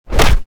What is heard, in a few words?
Punch Sound Effect